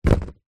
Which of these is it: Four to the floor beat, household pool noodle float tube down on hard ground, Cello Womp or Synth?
household pool noodle float tube down on hard ground